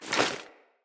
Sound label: Foley Action Default Melee Swipe, Default Clothing